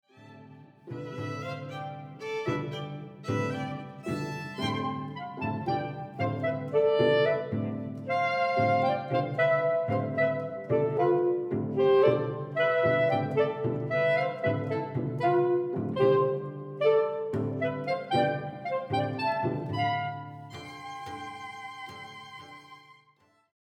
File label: Tango